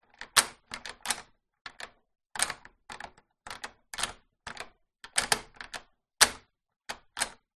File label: household door handle movements external front